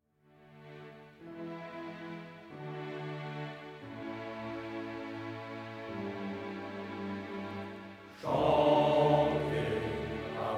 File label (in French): chanter